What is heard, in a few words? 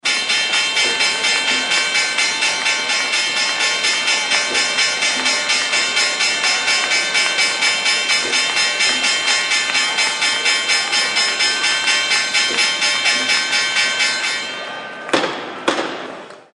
closing bell; nyse